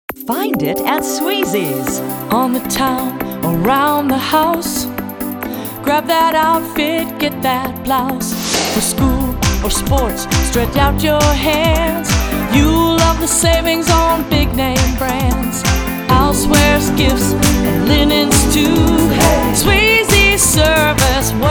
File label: Jingle; Swezey Department Stores